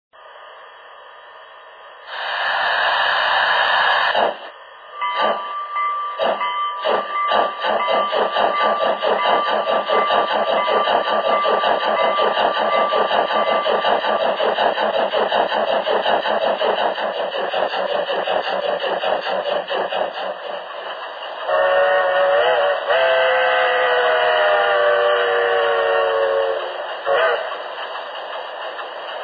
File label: heisler, loksound